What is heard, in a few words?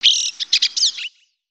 3D Birds Swallow; LU; ninjago; ambience ninjago